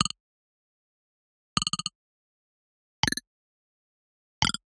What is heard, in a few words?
Digital Click Neutral